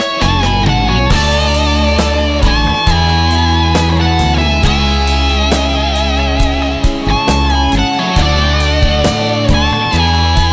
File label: guitar ringtones